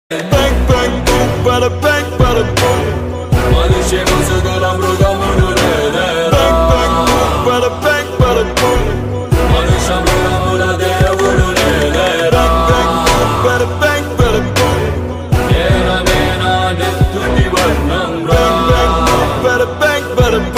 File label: villain ringtone bgm; dhruva; ramcharan; aravind swamy